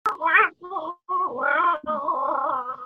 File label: Dino Roar; Alana